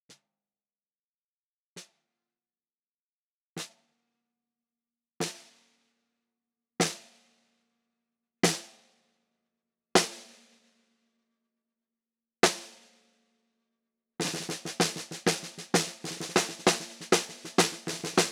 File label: SM 58 close bottom medium